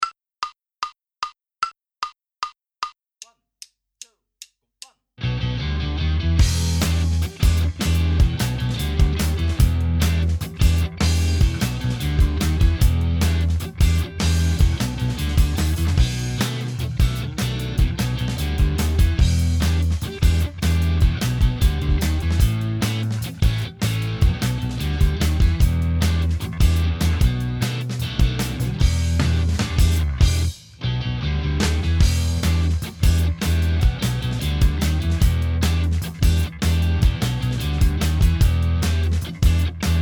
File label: Minus Keyboards; Inst Ensemble